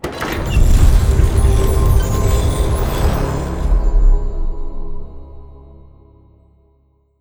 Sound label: start Bonus Game Release; netent